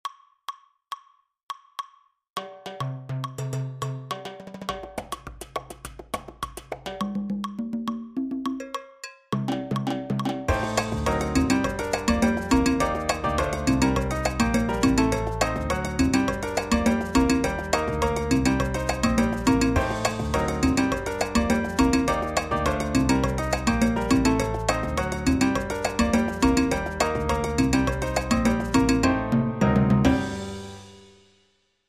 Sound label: Latin Percussion; finale